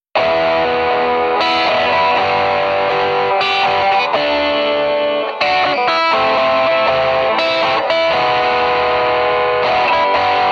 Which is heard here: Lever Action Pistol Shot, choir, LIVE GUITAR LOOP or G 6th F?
LIVE GUITAR LOOP